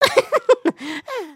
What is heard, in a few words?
pose, emotes